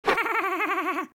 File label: Smeh horse; Troll Face Quest Unlucky; Android